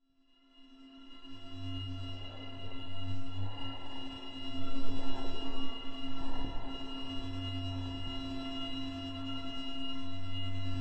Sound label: Magnetic Field; 99Sounds; Magnetic Fields